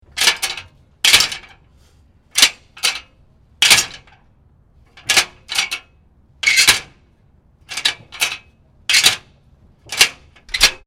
House Gate Metal Open and Close, various with latch; Gate Sound Effects